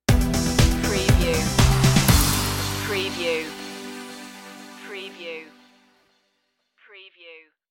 elements stinger

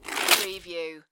Snow and Ice, ice or hard snow grate short

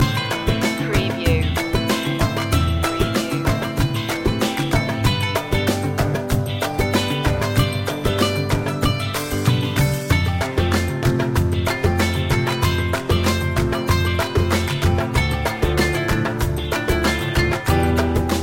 eastern travel loop

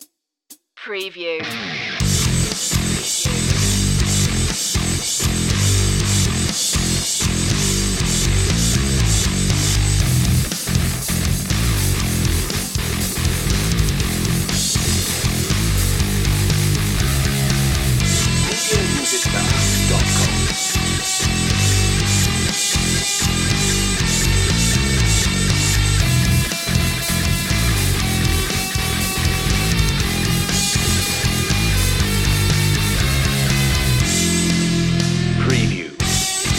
bass heavy jams

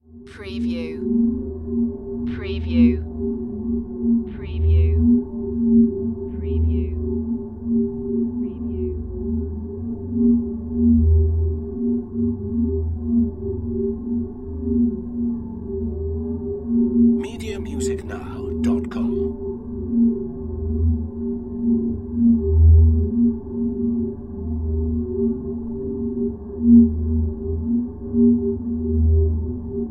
Power Drone Hollow

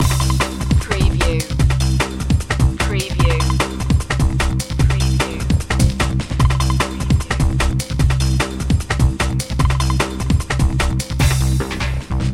aviation loop

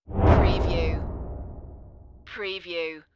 Whoosh

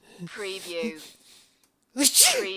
Sneezing, Male Sneeze

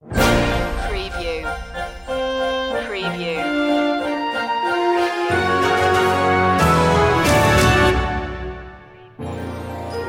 storming cinema ident

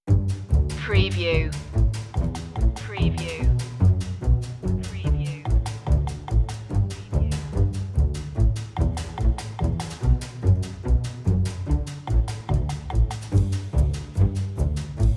bass perc

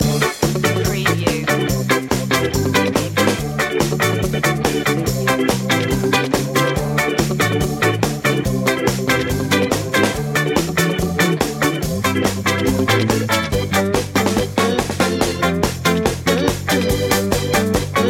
stomp

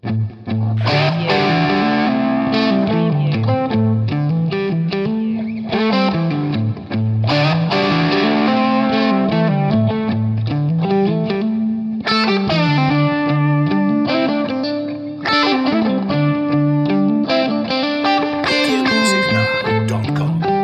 rough guitar

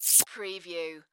Kiss; sound-effects-bible

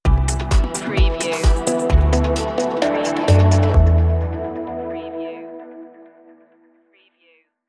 airscape stinger

